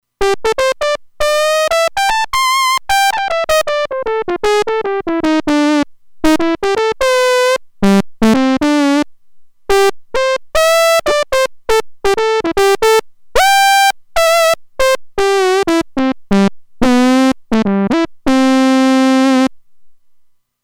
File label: Spit Valve, Roland Alpha Juno-2; Original Sound Chart patches